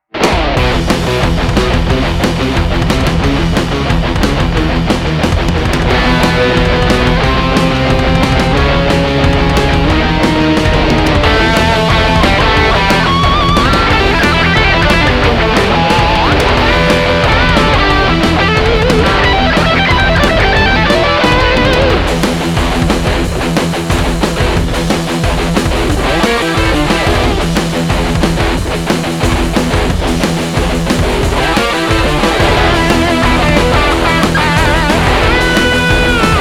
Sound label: Modern Melodic Sport Metal; Melodic Sport Metal is perfect for energy action videos with cars, sports, workout